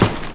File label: wood deb, impact debris wood; SiN